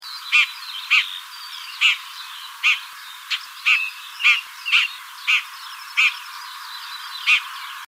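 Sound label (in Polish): gąsiorek głos zaniepokojenia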